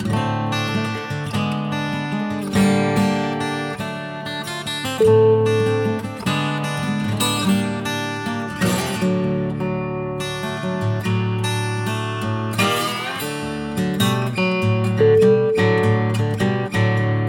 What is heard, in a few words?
slide blues ident